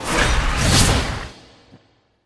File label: Crab Def Down; Seer; GamigoNA